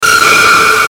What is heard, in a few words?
Hit Fast Distortion, Hit Fast Distortion Stab; Blastwave